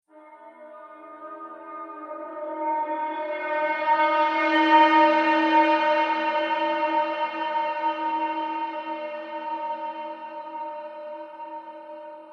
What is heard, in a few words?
Horror Tension Transition